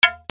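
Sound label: percussion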